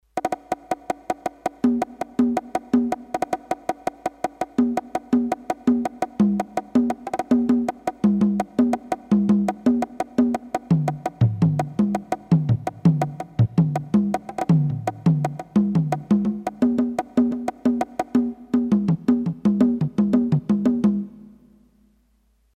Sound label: Conga Set, Roland D-10; Factory Patches